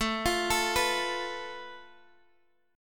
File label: A Suspended 2nd Sharp 5th, Guitar, Open F; Asus2#5; arpeggiated; x,16,17,16,14,x; JGuitar